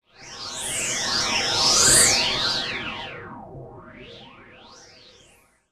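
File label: Flanged Oscillator Tones, synth tones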